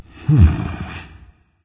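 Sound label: non verbal thinking, voice; cernunnos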